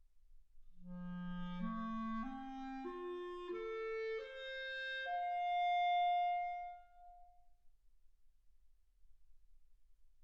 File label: clarinet